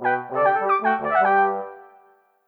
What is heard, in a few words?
win